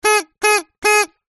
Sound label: party horn blow toot; zapsplat; foley